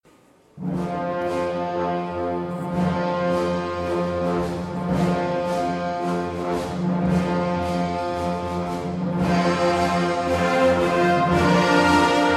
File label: FANFARE